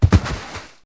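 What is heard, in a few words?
knock down, impacts; Wired for Sound Pro CD; Aristosoft, Inc.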